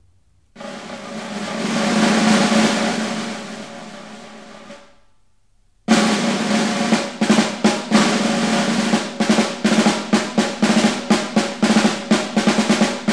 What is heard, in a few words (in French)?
caisse claire